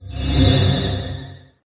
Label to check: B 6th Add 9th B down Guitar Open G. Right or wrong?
Wrong